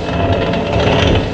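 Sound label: door start, doors; q3rally; movers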